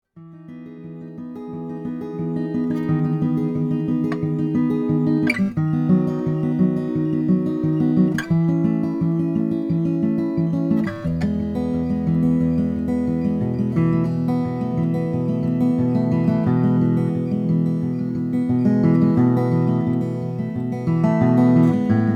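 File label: bourgeois sj custom brazilian rosewood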